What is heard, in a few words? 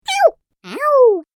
Little Minion Voice Pain Sounds, Cartoon Voice Sound Effects